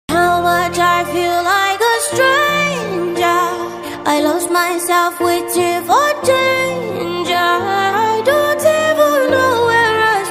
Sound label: Instrumental Hook